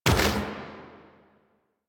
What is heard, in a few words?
Fire; Warframe; minigames; Caliber Chicks minigame; boss